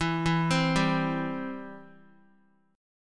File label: E Minor Sharp 5th, Guitar, 4F,4A,5C,5G,5C,6E; Em#5 arpeggiated; x,7,4,5,7,x; JGuitar